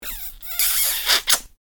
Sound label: kiss; human